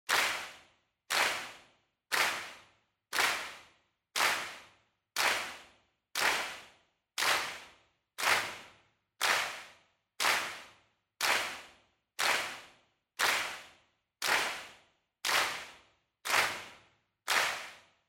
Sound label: Handclap Mid